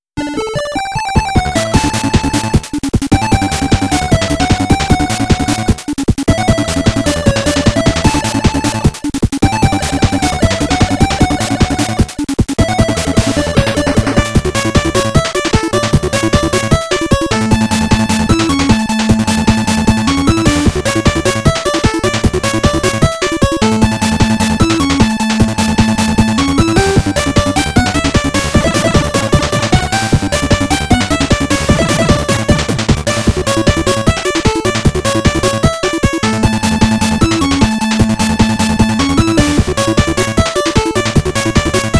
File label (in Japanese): オリジナル 8BIT Instrumental; FAMISON 8-BIT 01